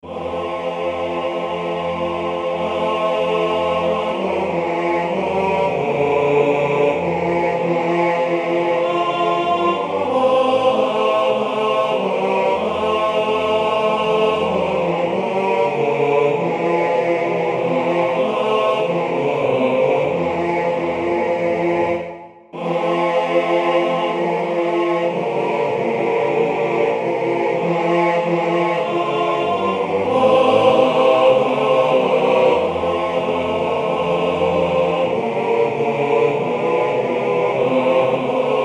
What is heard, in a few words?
ttbb, male voice choir; deep river; Arrangements for Male Voice Choirs